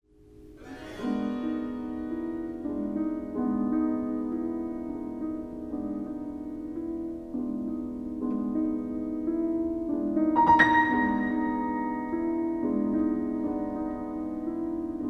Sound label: PIANO DUO SONATA